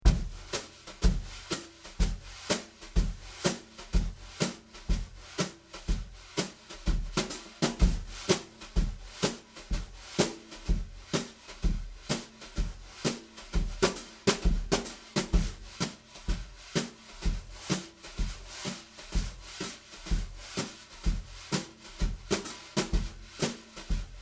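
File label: Brushes EAD Overhead SM57, snare drum; Yamaha EAD10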